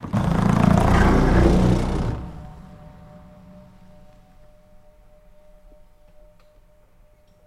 Car Tire On Grate Cros

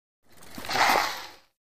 Biking Tires Skiddi

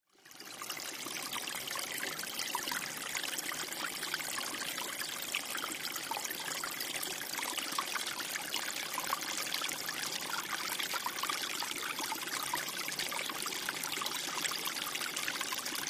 Water Brooklet Easy, streams

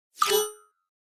Pop Up Elect Whip Tap S, pop up element

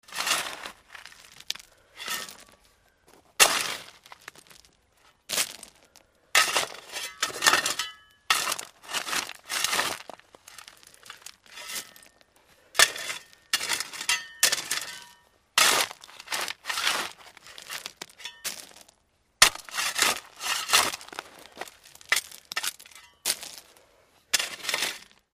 Shovel Dig Gravel Met

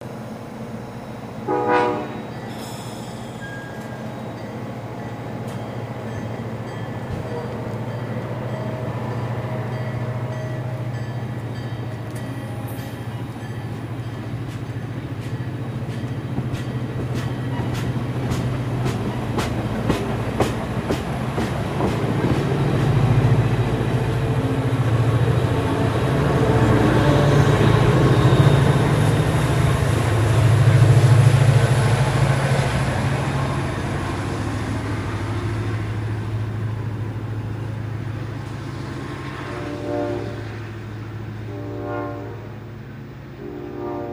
Train Leaves Station

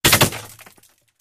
Bullet Impacts Metal

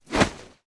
Heavy Cloth Snaps

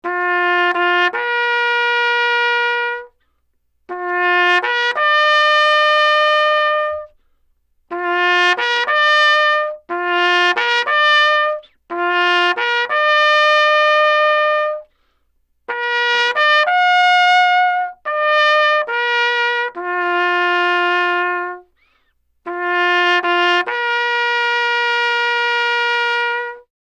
Bugle Plays Taps, bugle civil war

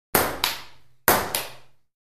Ping Pong Ball Bounc

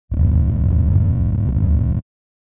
LFE Electronic Rip with LFE

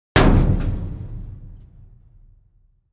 Dumpster Type 2 Hit with Sledge Hammer

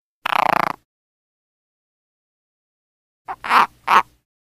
Frog Leopard Croak S

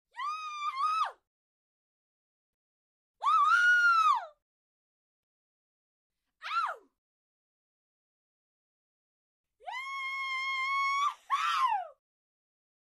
Single Female Cheer

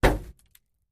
Hollow Metal Hits Lot, barrels buckets cans

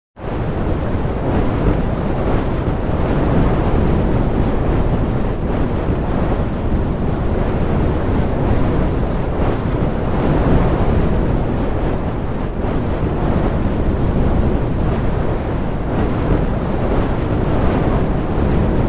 Wave Fire, fireballs & bys

